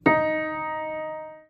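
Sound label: piano d#4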